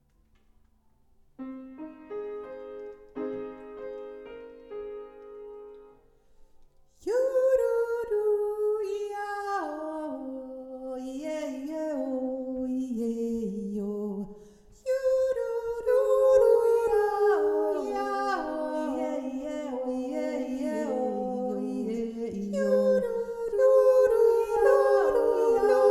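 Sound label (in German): pygmäen jodler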